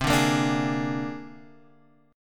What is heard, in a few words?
C 7th Suspended 2nd Sharp 5th, C, down, Guitar, Open G